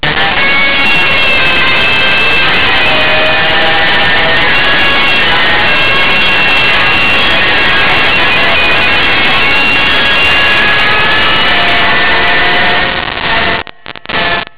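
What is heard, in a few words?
guitar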